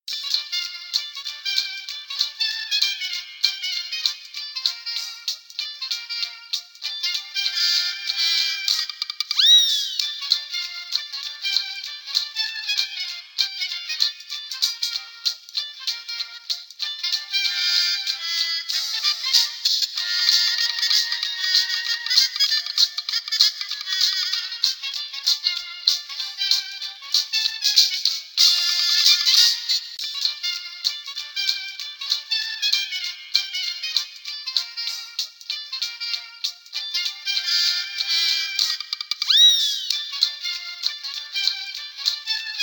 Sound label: Elevator; The SpongeBob SquarePants Movie video game